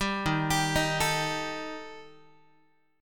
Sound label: Eb Major Flat 5th Eb Guitar; EbMb5 arpeggiated; 10,11,10,13,13,x; JGuitar